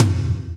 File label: TOM TOM, Roland